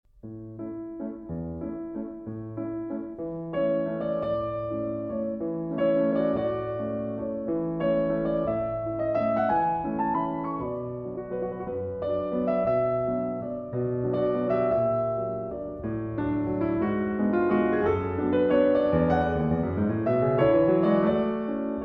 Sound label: Waltz No. 1